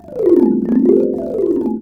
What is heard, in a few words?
RANATTHUN; Spectrasonics - Heart of Asia CD2